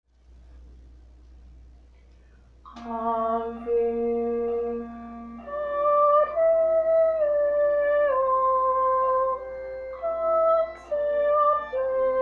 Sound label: Soprano; Ave Maria (Minal)